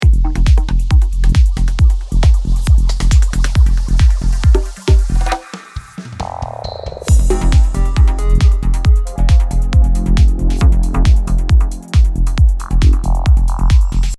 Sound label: fill